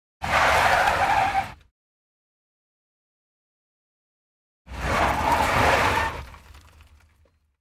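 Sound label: sharp car brake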